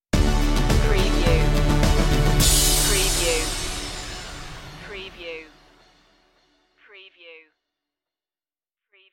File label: second sun stinger